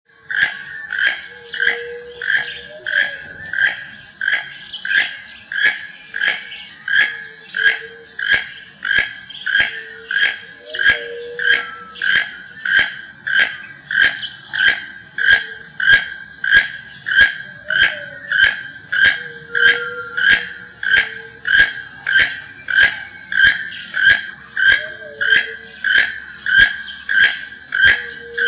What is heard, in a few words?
keel billed toucan